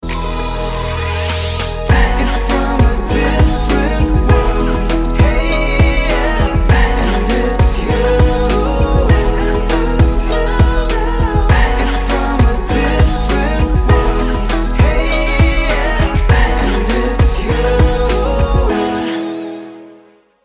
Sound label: Ringtone; kia; Carens